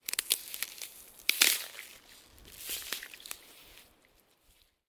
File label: Ice crackles; SyrinScape SoundsScape Collection; bbh Haunting part 1; TTRPG